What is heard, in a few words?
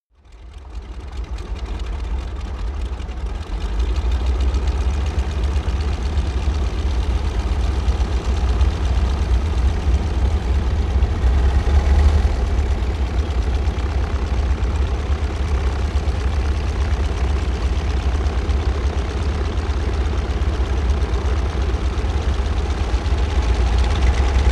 propeller plane ju52 engine idle drive